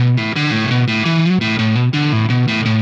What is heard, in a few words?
Static Guitar; Sonic Foundry (Sony Creative Software); Indie Rock Anthems; Session 13 - Static